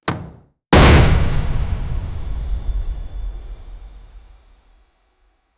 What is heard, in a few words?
jump in platformer game; arcade